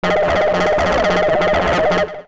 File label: Synth Mono; TSMtoolbox